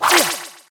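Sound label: Shinobi attack; Fight Knight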